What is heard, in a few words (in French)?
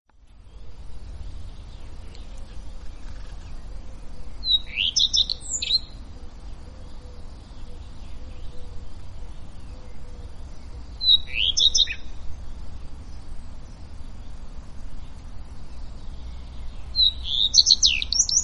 Rougequeue a front blanc